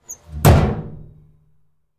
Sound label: Cabinet Door Slam